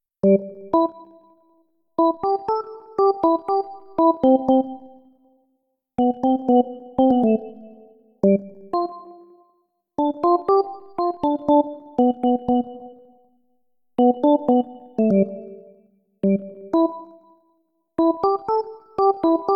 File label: melody